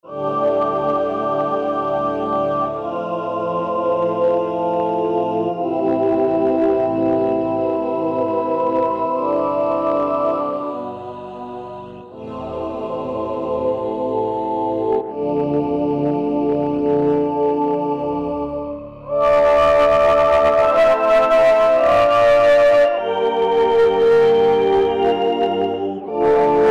Vox Ensemble; Green Oak Crystal

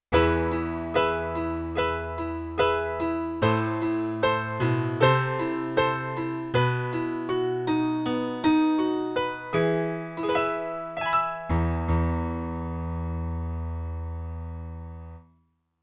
A Piano & E Piano; Yamaha TX16W; Jazz Performance; Jazz Performance 2

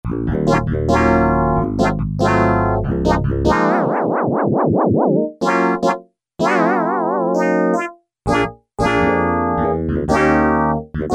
SYN-CLAV, Yamaha DX7

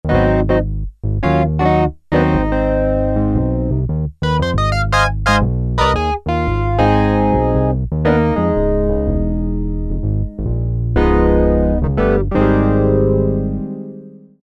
Reed Piano, Korg Poly-61M

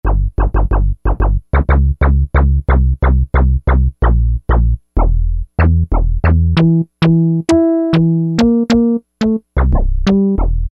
Rap Bass, E-mu Proteus-1; Presets

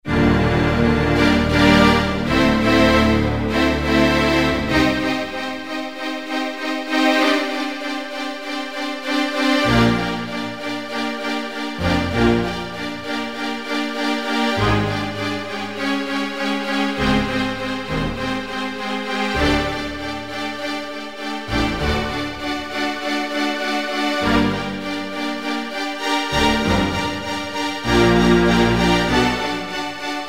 Strings

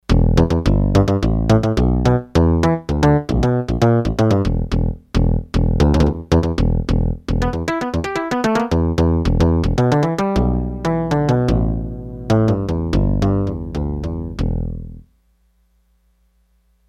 Timbass, Roland D-10; Factory Patches

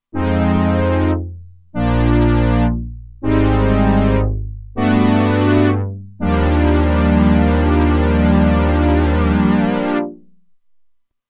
Brass, Roland Alpha Juno-2; Original Sound Chart patches

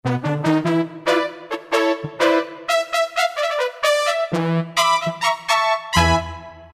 Horn Section, Roland D-50; Factory preset demos

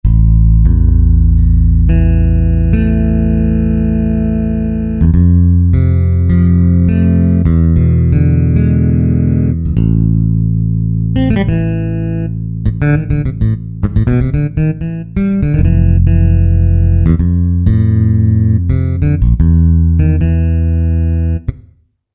6 strng BASS, Roland SRX-07; Roland SRX-07 Ultimate Keys

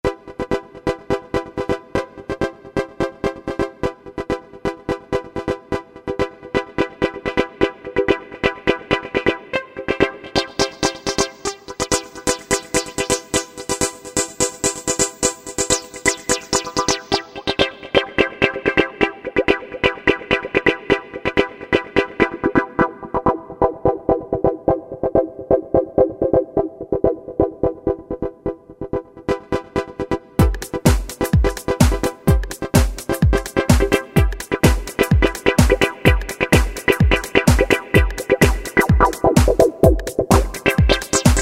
Chord Sq, Yamaha YPT-400; Voices

